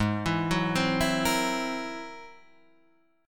G sharp Augmented 9th, Guitar, Half Step Down

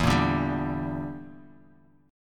E Minor 7th Flat 5th E; strummed

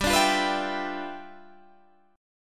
G 13th G, chord

